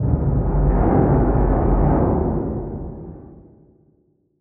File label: hull damage; Barotrauma; Barotraumatic; Hermits